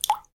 Balloon waterdrop

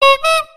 Clown horn one honk

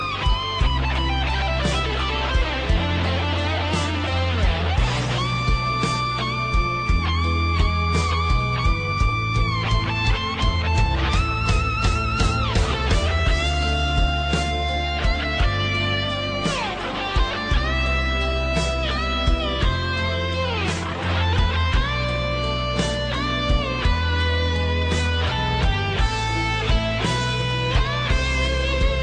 Blues Mood

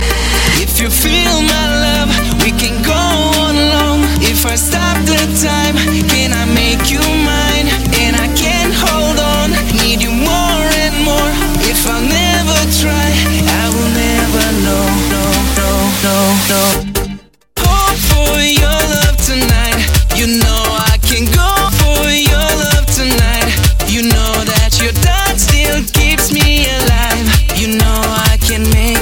House

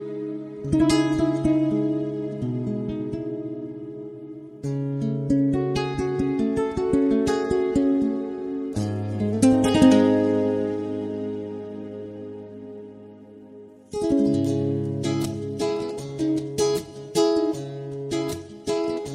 Romantic Guitar

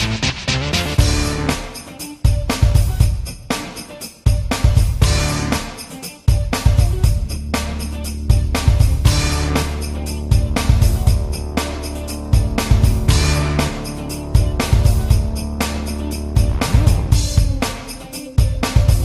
Jam Instrumental

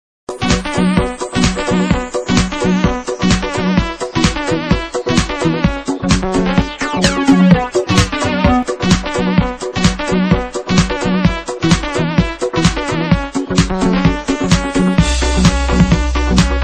Saxo

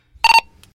Motorolla

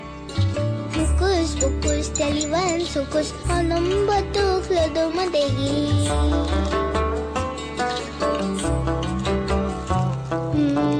Cute Baby

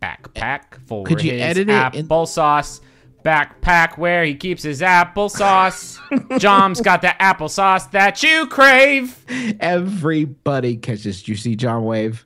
applesauce beep long

